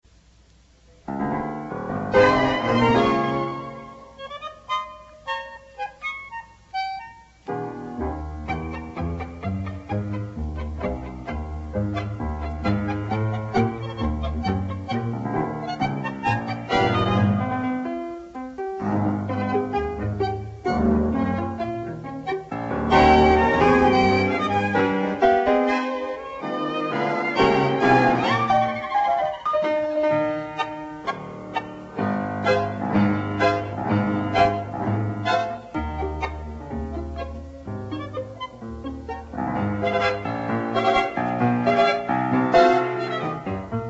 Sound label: tango